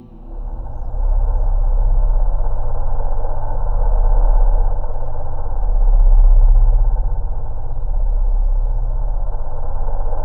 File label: C1, Synth Leads